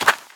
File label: land ice; Scrat